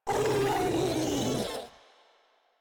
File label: Infested Ancient Vocal Attack